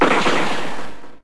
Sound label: Gun